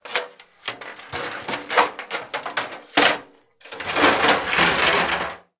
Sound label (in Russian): grohot ot neostorozhnogo padeniya odnogo predmeta